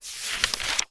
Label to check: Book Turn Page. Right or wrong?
Right